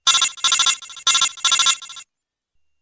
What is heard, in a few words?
Codec; MGS 3